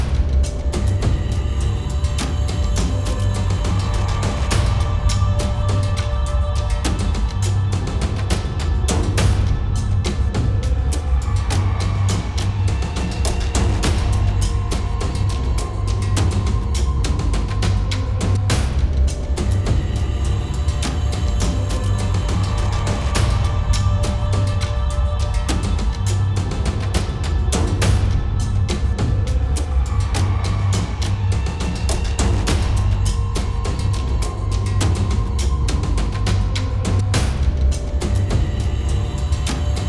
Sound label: Ice land BGM; wisgr windows gamerip 2018